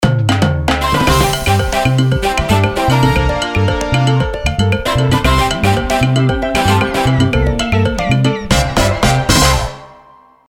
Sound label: Ringtone Intro